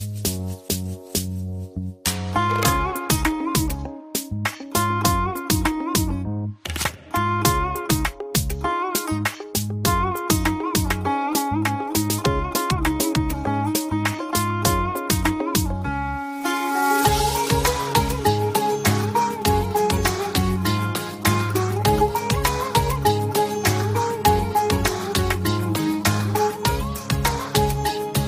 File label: samsung ramzan ringtone